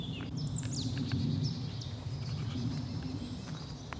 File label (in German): sumpfmeise